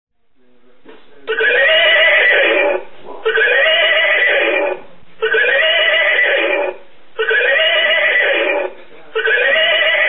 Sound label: Horse Alarm Clock